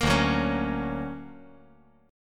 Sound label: D Augmented Major 7th down